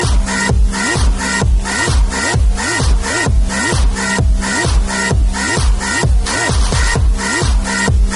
Hop Hop Tones; X8 Hop Hop

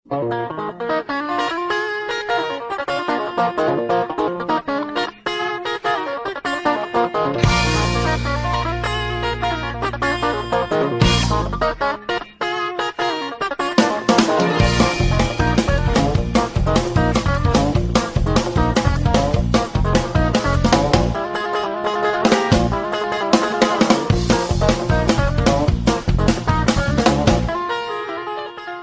Country Guitar

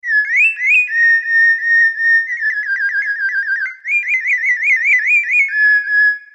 Iphone5 Bird Whistle

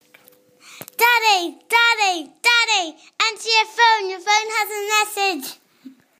Message

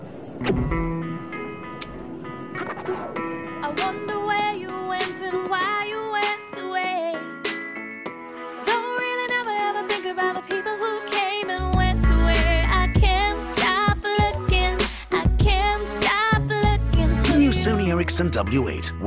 Sony Erricson W8

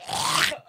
Throat Clearing

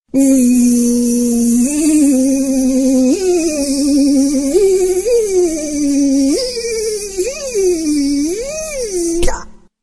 Fly Splat

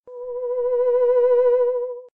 Flying Ufo

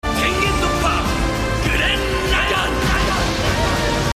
Shout; Ttgl

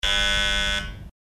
buzzer; gundam

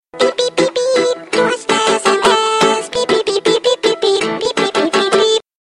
Pipipipipi Sms

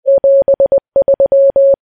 Morse Code

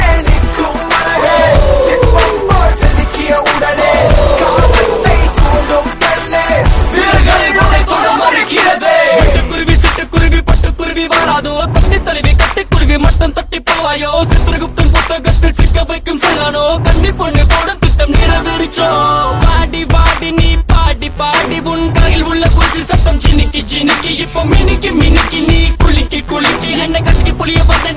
Tamil Rap

